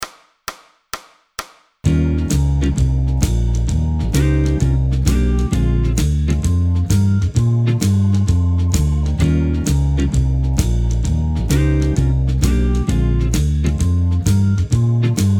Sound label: Major Solo Middle Four Bars